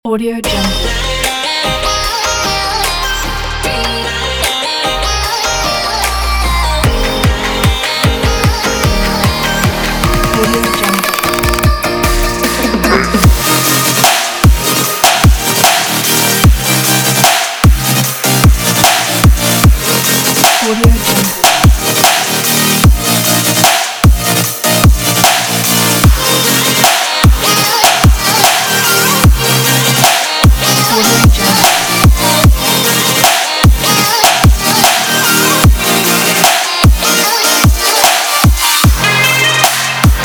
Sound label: future bass